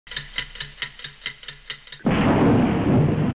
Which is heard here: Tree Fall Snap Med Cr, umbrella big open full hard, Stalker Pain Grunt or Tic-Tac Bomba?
Tic-Tac Bomba